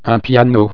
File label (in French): s piano, sons